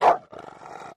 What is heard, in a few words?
Dogs Medium Size Dog